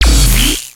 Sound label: laser impact